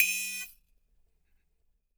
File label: CYMBL; ILIO - Ethno Techno